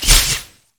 wildcards; LoL; twisted fate

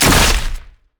bola strike; rengar

